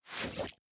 digital whoosh

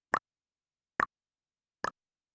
clop; Click; Pop

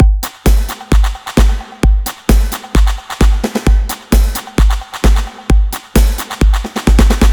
fast 80s brit pop beat; looperman

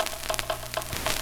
LP loop, Vinyl static; polygoniix Intro Essentials pt2; Electronic; Glitches; NoiseCollector; voodoo

